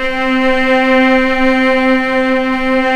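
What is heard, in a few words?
cellos C4, String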